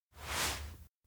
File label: lift face down; anemoi